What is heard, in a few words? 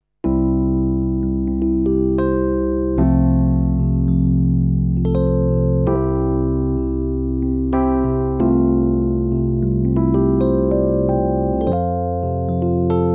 Rhodes